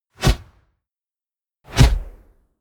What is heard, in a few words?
push